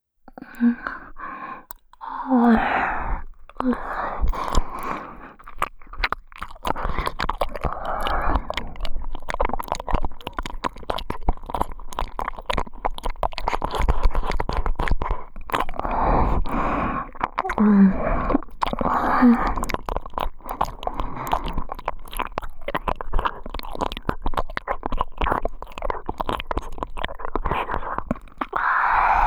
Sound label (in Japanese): 耳奥舐め犯し（右）ループトラック, ASMR